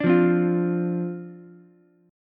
D-Minor 7th, D, Ukulele; Dm7 strummed; 7,5,8,8

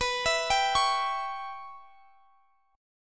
Db Minor 7th Flat 5th B

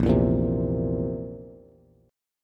A sharp Suspended 2nd Flat 5th A sharp, Bass

